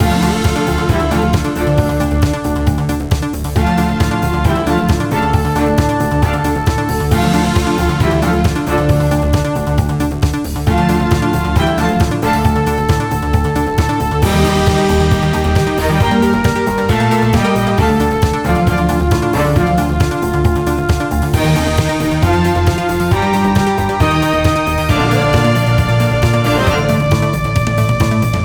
Heroic Music; Firegirl: Hack 'n Splash Rescue DX

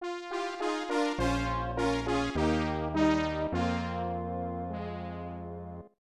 Failure

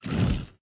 Jelly slide in

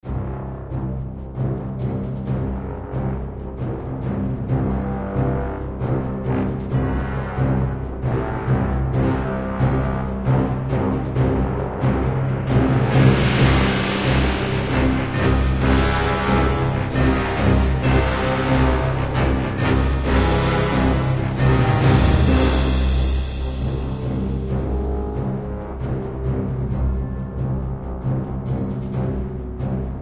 Lava; biglands a game made by kids

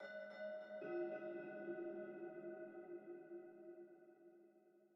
Env Field Night Cave

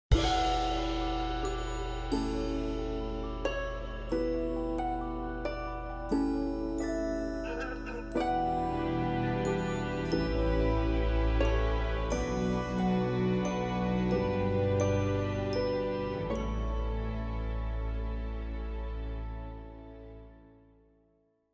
Forest Lullaby Outro; Forest Music; Cinematic

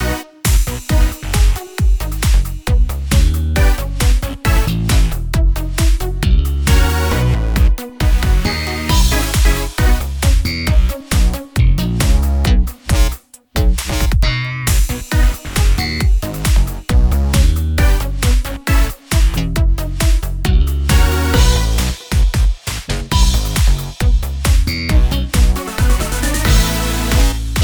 Title Short 135bpm

